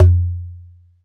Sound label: Conga Battery, EMU E-III; Samples vol.55 - Retro Sampler